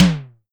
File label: SIMMONS SD-5 drums